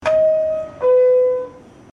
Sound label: Notification; Subway